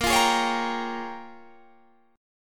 Bb Minor 13th chord; strummed; JGuitar

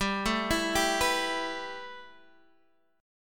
B 7th Suspended 4th Sharp 5th G

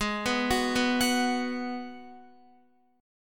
G sharp Minor 7th G sharp, Guitar; G#m7 arpeggiated; x,11,11,11,11,14; JGuitar

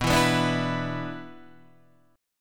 B-Minor Double Flat 5th, Guitar DADGAD

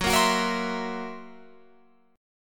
F sharp 7th Flat 5th F sharp down Guitar Open F; F#7b5 strummed

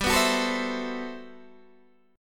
G Major 13th, Guitar DADGAD

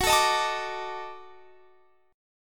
D sharp Minor Major 7th Flat 5th F sharp

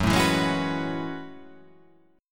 F sharp Minor 11th, guitar

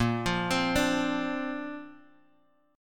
Bb Major Add 9th, Bass Standard; 18,20,22,19; arpeggiated

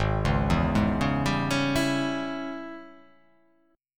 G sharp Major 7th Suspended 4th Sharp 5th

